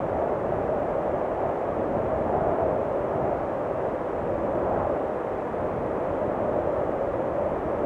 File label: office outside wind; ambient; weather; cstrike